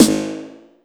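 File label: snare processed, Disco House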